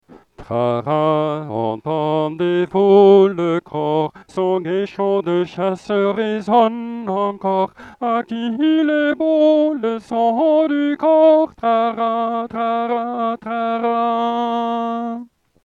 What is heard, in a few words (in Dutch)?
muziek herfstliederen trara dat galmt frans entendez vous le cor zang eenstemmig